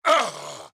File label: AHurt; Black Adam